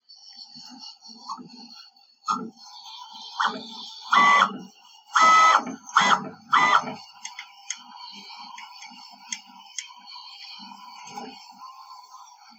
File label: xl click; clicking noise while moving tool heads; prusa3d